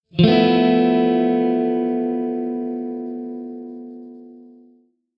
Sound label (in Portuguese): Campo Maior Acordes F7M11